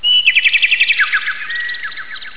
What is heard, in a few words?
bird sing